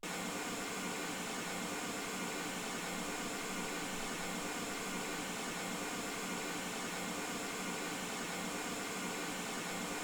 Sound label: mls16 48kHz